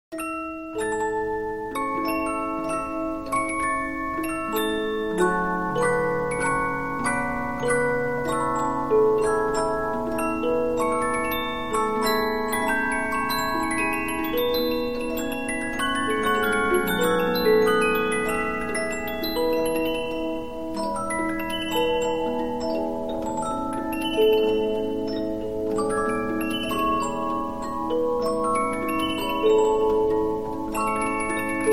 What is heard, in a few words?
Polyphon, 15inch disc musicbox